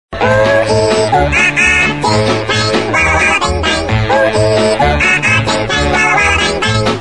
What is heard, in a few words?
Funny Baby Ringtone